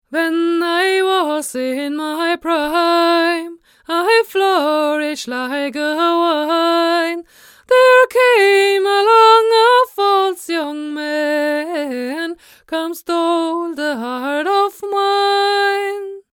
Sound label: Neumann TLM102 H1 far